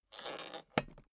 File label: suitcase close latch lock slow